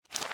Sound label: Footstep Paper-Sheets Rev